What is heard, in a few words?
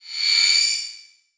Flash Wipe; Multimedia Internet CD-Rom Flash